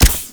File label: Rush Step; Warframe; Runner